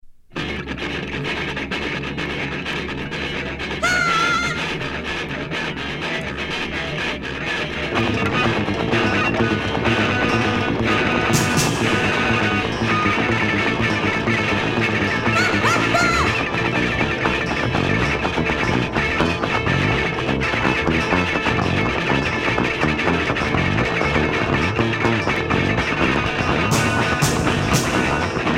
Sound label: Guitars